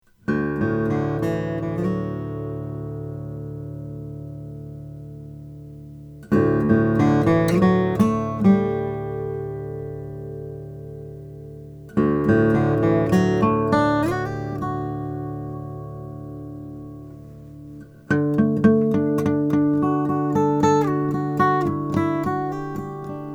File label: tippin crescendo brazilian rosewood